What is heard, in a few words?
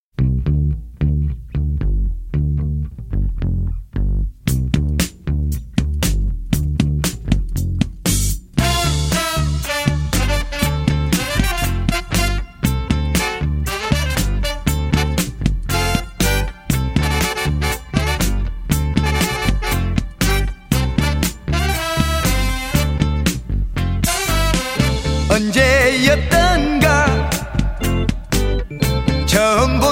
funky Korean soul